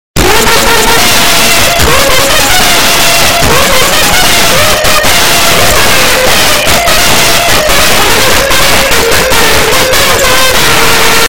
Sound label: phonk; mr beast